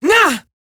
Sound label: pain, female; en; Eximus Grineer; Battle Barks